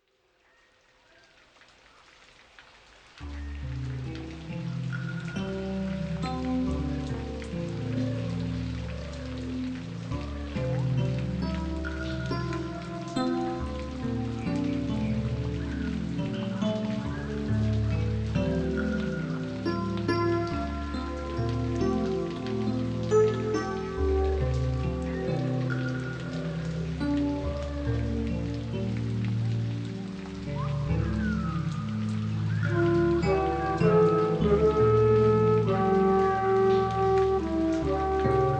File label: Tropical Rainforest Retreat Zen Spa Music